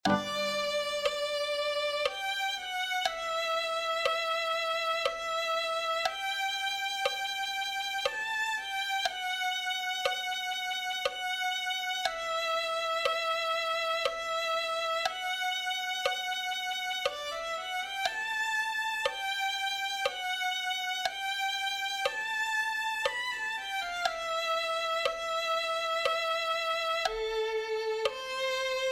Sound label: violin